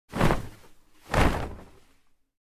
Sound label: Karate gi fight movements